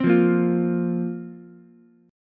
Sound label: A sharp Suspended 2nd down Ukulele; strummed; A#sus2; JGuitar